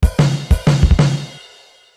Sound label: Hard Drum Fill, Yamaha DD-35